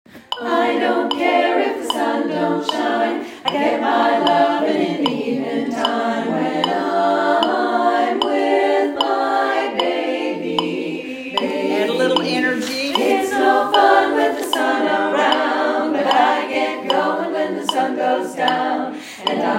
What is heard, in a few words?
with metronome, chorus